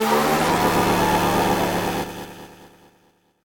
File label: chainsaw; Gachitale